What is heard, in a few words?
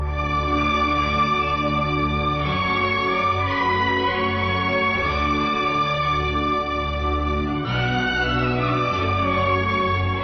Sad Ring; Thalapathy